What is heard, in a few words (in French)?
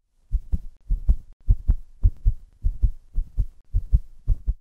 coeur, sound design